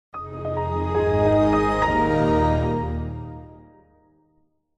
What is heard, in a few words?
Microsoft Windows XP Startup Sound